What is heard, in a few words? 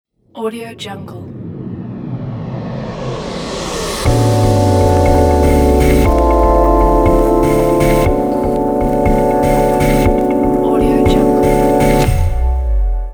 stylish lounge logo